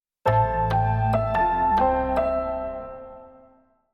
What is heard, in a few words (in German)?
klingelton sms; tonmarke